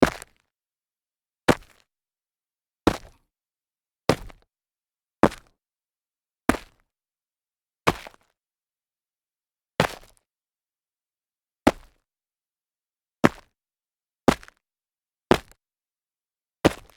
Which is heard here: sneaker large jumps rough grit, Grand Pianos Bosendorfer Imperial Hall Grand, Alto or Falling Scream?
sneaker large jumps rough grit